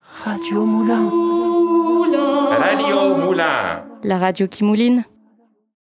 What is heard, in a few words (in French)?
jingle radio; radio moulins